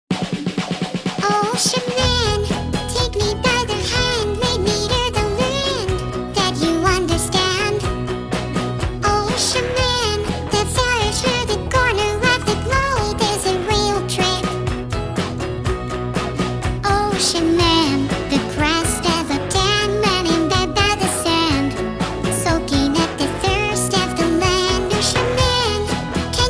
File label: Singing; Virtual YouTubers; Neuro-sama